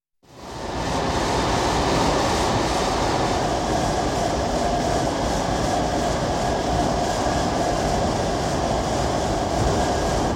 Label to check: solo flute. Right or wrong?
Wrong